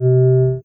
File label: Sing